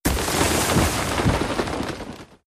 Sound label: attack; Skillzgaming; battle Mania Desktop